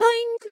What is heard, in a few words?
Boing; Neru; SM64 CO-OP; Triple Baka Pack